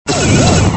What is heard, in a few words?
boss appear; MMX4SFX